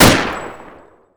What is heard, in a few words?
ots33 fire; STALKER